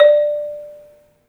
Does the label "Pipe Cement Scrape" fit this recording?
No